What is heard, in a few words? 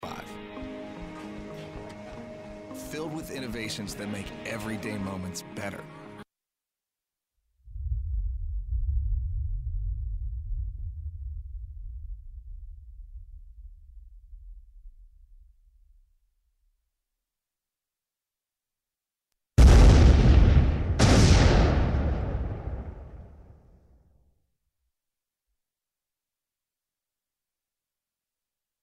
crashes and explosions